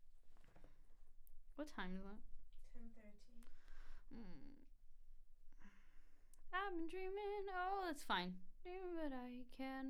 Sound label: Vox